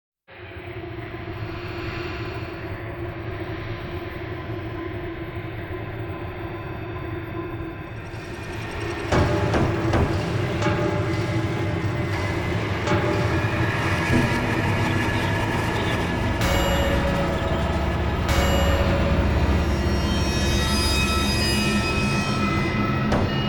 Noise

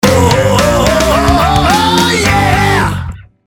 ROCK n RAP NOTEXT OTBIVKA; Большая разница

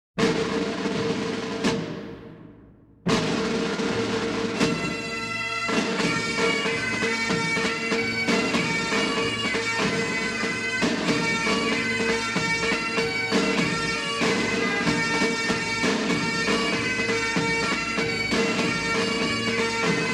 Regimental March, bagpipes and drums of Scotland; The Gordon Highlanders

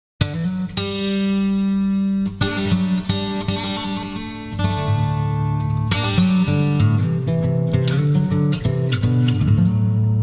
acoustic version

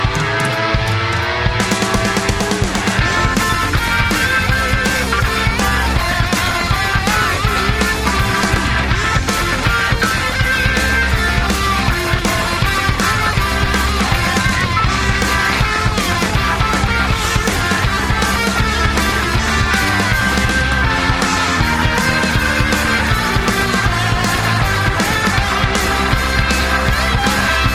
drum Solo

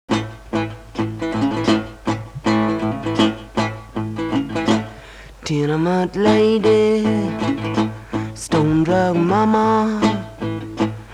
Acoustic

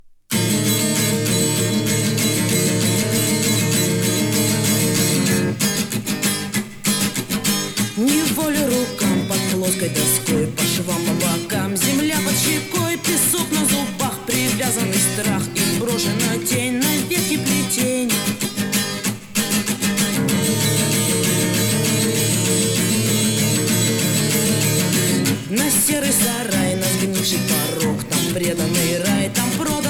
Reggae

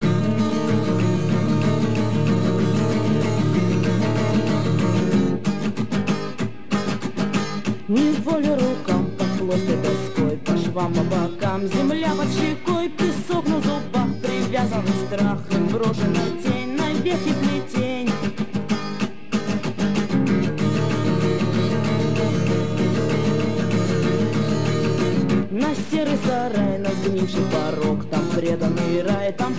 Reggae

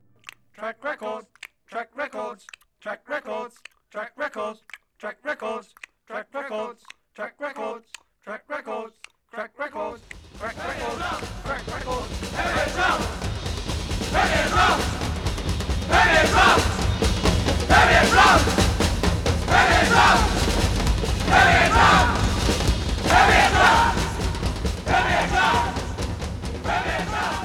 Premier Drums

